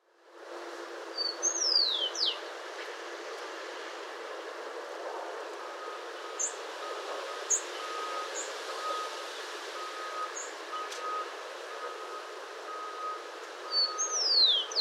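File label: Rufous Collared Sparrow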